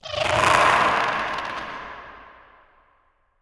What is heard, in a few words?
Timid